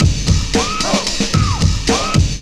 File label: LOOP, DRUM; E-Lab; X Static Goldmine 2